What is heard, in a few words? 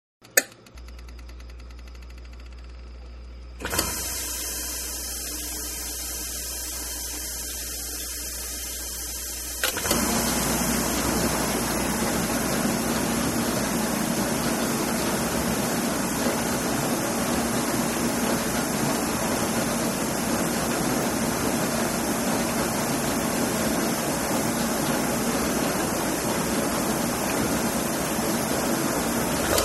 Dishwasher Seq, Appliances; Dishwasher Loop; Household